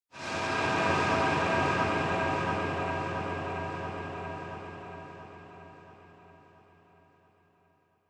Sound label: Scene Flashback Long